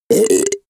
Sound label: goblin wet burp choke; koerpergeraeusche; comedy-cartoon; soundpool